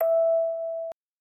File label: orgel